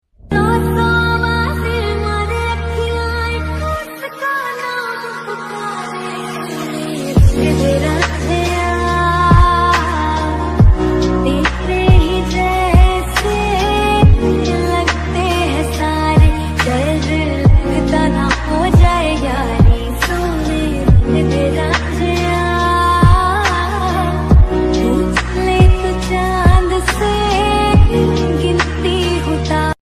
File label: lofi mix ringtone love ringtone hindi caller tone